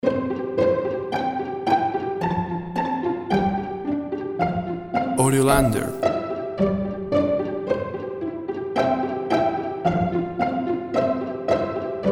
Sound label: Pizz Strings; Twinkle Twinkle Little Star